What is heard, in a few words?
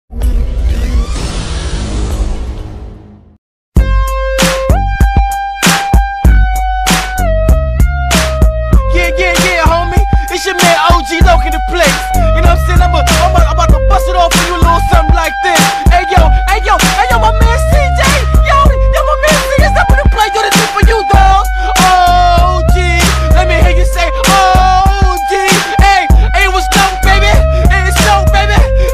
Rap; GTA SA; OG Loc; Rap Music Video